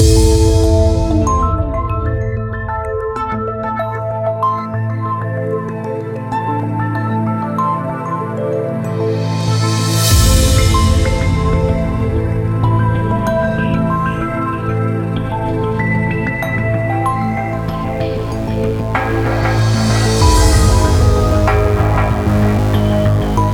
Exploring Space Relaxed loop; Speedball Arena VR

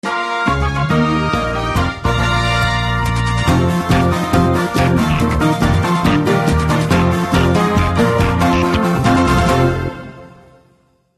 Sting Comic Victory; Tales from the Borderlands

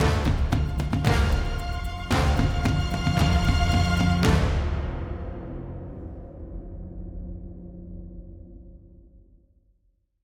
Graveyard Suspense

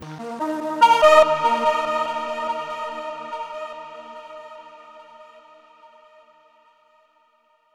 Stinger Puzzle Tone

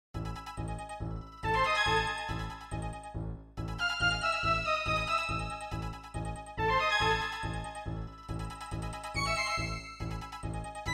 Negative; Star Merc

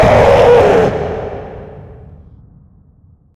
Paper Mask Jumpscare; Backrooms 2D; Android

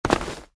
Land

